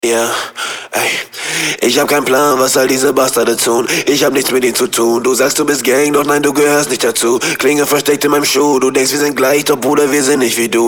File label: autotune inertia 0; Rap Vocals